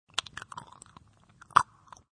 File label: Extraction, Extracting a loose tooth; Hospitals; Backgrounds